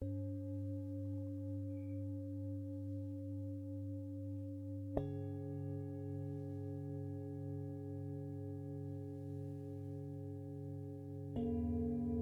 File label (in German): klangschalen mit rauschverminderung rode nt usb mini